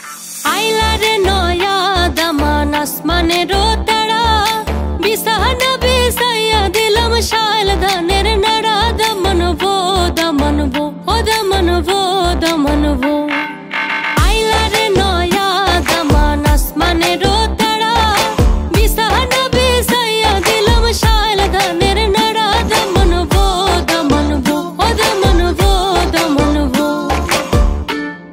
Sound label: Bengali ringtone